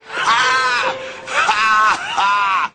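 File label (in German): lustige lachen klingeltöne; diddi lache; alf lachen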